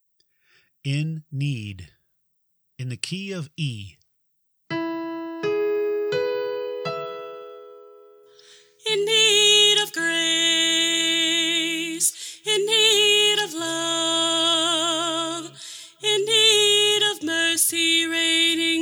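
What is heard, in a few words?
In Need Soprano, choir part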